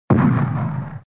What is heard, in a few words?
explosion; Puffer Popper